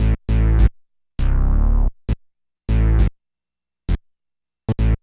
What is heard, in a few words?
BASS LP